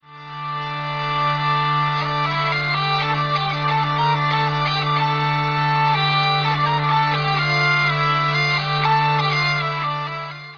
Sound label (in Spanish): zanfona; tradiberia